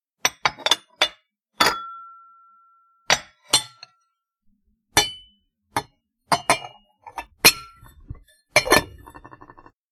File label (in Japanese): syokki